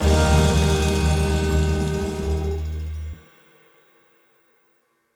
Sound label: small win end; grandspinn progressive